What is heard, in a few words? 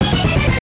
jingles HIT; Kenney's Sound Pack; Music Jingles